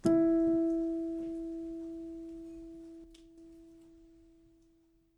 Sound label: piano sample